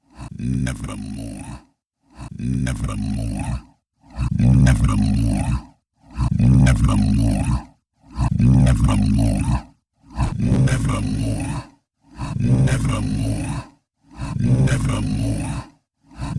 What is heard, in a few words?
FX Delay; ChatDSP